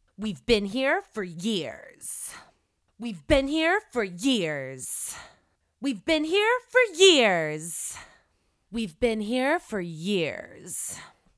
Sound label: weve been here for years raw vocals